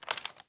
lr300 foley, weapons

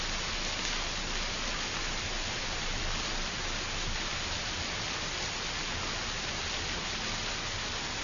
rain

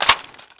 xm8 mag in; czero opforce

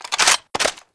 hk417 clip in; czero opforce

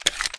usp clip out; csof

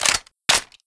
SR25 clip in, weapons; csof

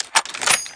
m95 bolt pull; czero opforce

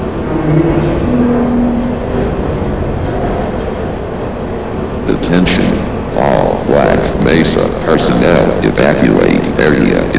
industrial; cs biodome

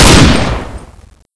sg552, weapons

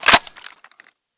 lr300 bolt release

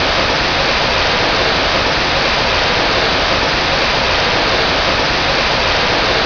steam jet; czero opforce

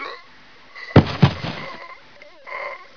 die, player

